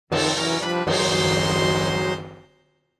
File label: Item Fanfare; Four Swords Adventures